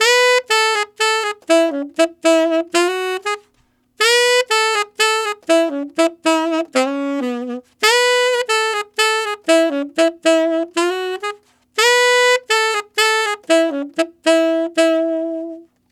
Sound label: Tenor Rock in E maj, Tenor Sax Loops; Sonic Foundry (Sony Creative Software); Jazz Solos and Sections